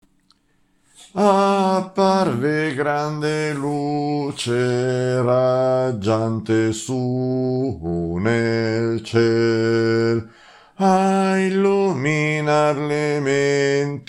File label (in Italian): contralti